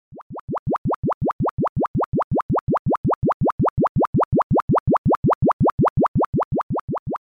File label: bottle calc